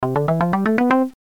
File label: SMS Ringtones